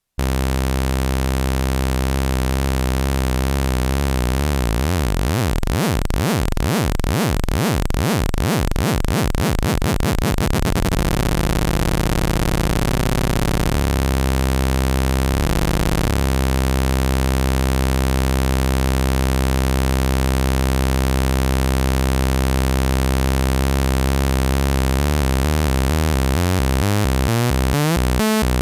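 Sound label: lfo, synth; TEST: all LFO shapes + freq; Polivoks formanta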